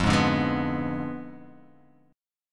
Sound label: F Diminished 7th F down